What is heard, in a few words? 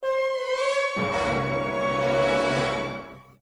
DURURURUUUUUUUUUUUUUUUN, Suspence sfx